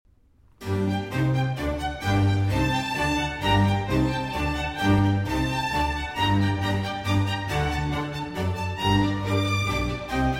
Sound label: Cello Concerto in G Minor, Allegro; III. Allegro